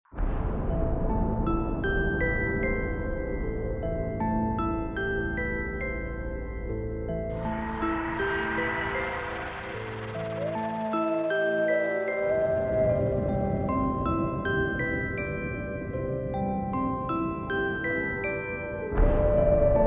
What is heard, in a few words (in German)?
Instrumentalmusik Wolf; musique instrumental